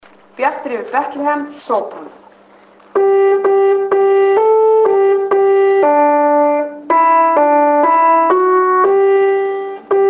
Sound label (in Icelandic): sópran; Bjart er yfir Betlehem